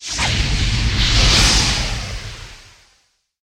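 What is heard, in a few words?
signal flare rising upward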